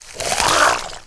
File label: Sinister Squashling Attack